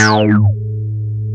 ACID BASS; AKAI S6000 CD-ROM - Volume 1; ACID LINE

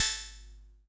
MT36 CYM, Drums; Best Service; Dance Mega Drums

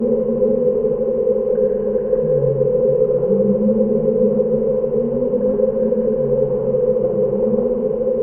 SPACE DOCK; Distored Reality

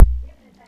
Roland f30 drums

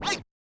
Yamaha DJX 2B, Scratch R

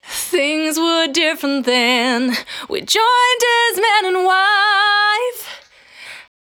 Cry 68bpm B, Vocal; Zero-G

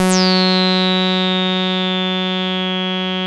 SAWREZO, BASSES

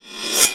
SPRING; Rarefaction; Poke in The Ear With A Sharp Stick

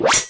Speedy, cartoon

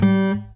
pop, Guitar; 90 s Sample CDs; Quantum Leap - Guitar & Bass

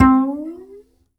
Upright Acoustic Bass; Larry Seyer

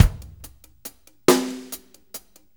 6-8 Loop, Full Kit Loops

